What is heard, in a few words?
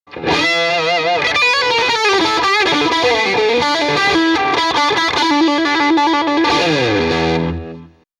m75 tele light od jtm45 solo